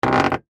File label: Car Door Open Smashed, Transportation, Automobile, Car, Door, Open, Smashed, Creak